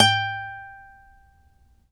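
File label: acoustic finger G 4, guitar; East West; Steve Stevens Guitar Samples Collection; ACOUSTIC FNG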